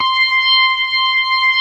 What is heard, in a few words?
STOPS, organ combos; Keith Emerson Signature Series